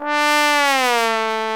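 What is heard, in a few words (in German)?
POSAUNE ABGANG D3; Best Service; Alpine Volksmusik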